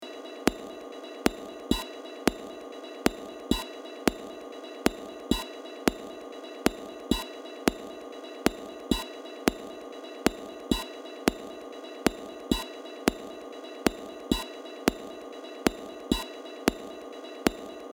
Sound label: Groove